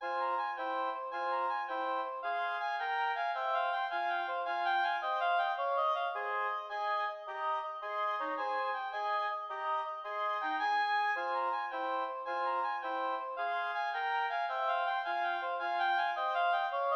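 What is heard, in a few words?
gigue oboe trio; johann mattheson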